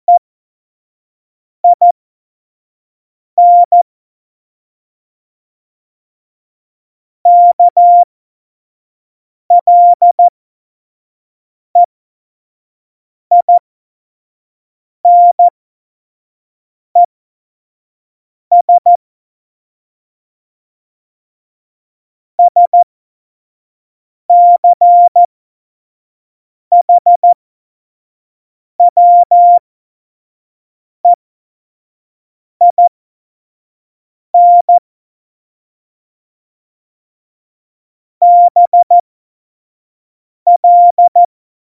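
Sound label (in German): Witz, cw morsen; Morsekurs; Witze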